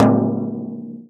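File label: FRAME, INDIAN PERCUSSION; Big Fish Audio - Didgeridoo and Other Primitive Instruments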